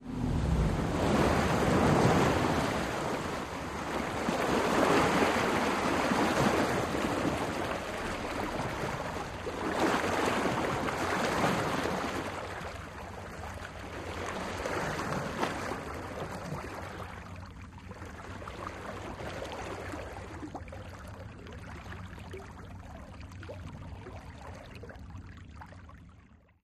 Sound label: Military PBR Water Mo